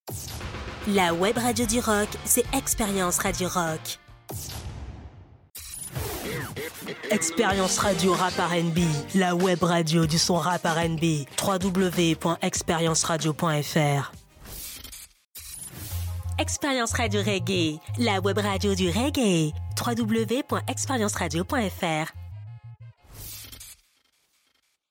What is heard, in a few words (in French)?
jingles Rock voix féminine Rap rnb voix masculine Reggae voix féminine; ExperienceRadio